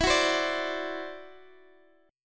E 7th Suspended 2nd Sharp 5th E down Guitar Open D